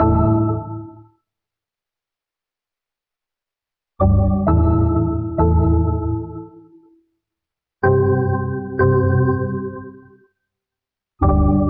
keytones; Loops; Electronic

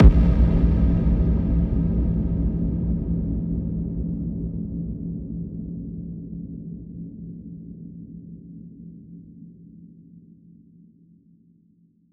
electric explosion; Crash Bang Boom

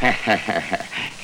laugh kung fu; ooh ahh

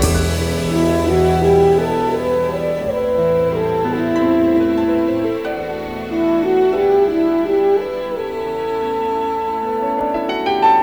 strings, Sad Loops

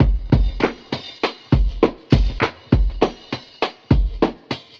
stompin and clappin, Funky Drum loops

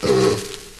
burp short

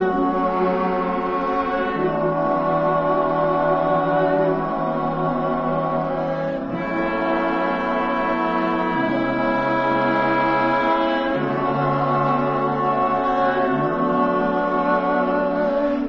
voice; Sad

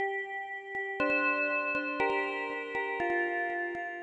keys

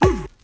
"ooh" male

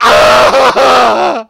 crying male; ooh ahh; human

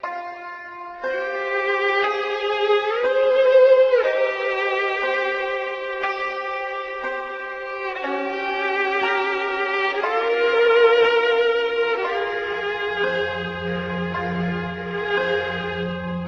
strings, sad loop

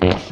fart short

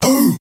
"hoo", voice

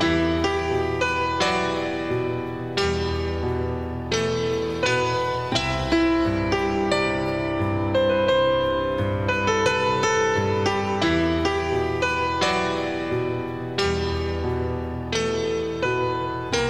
piano epic, Loops Sad

